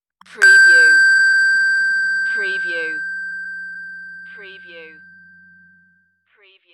Long Beep

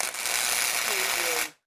Arc Welder

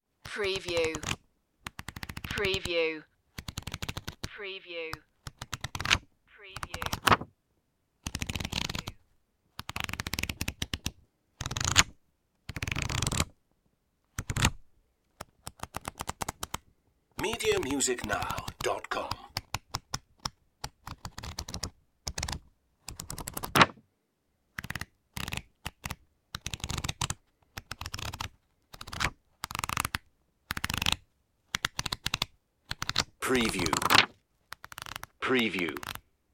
flick through a book

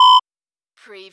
Servo Panel Sound - Beep long

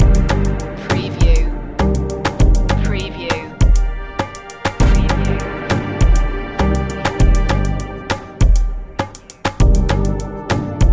the clockwork loop

